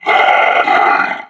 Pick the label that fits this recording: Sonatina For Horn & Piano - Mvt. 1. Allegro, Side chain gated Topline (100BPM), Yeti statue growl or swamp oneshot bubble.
Yeti statue growl